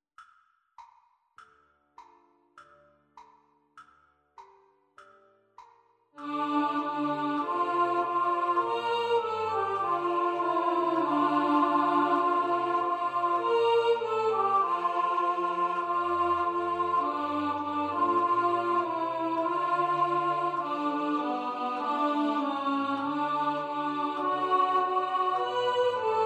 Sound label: Alto Voice; 3 voix